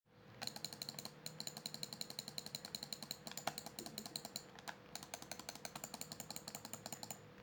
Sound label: Razer Buttons